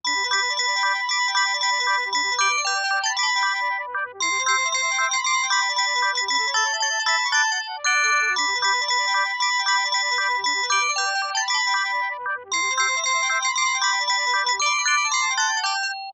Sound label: phone ringtone; NS Studios - ultra sound collection